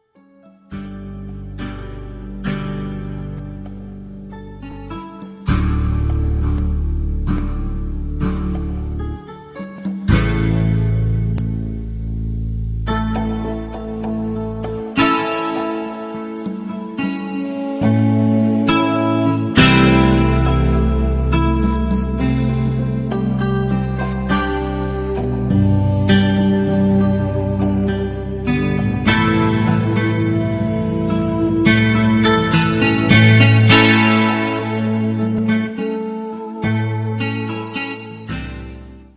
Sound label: drum solo